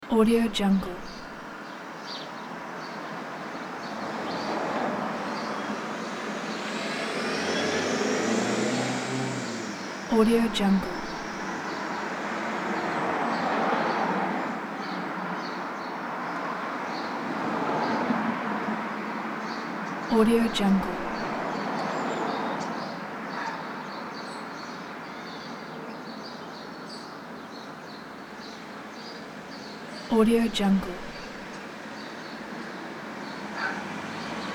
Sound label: uk urban traffic ambience